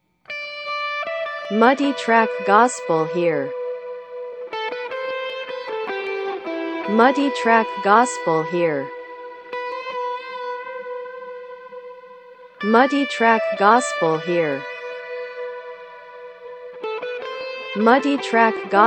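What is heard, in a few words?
electric guitar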